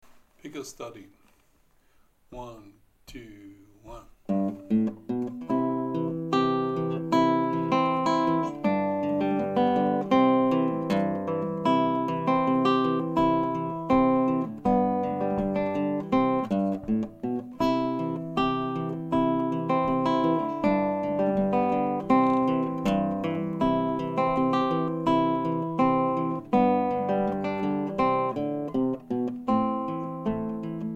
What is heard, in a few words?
Pickers Study